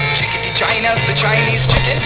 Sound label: chicken